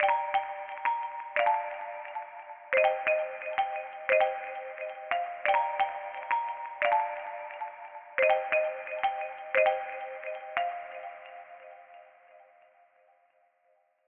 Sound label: Pluck C Minor 88bpm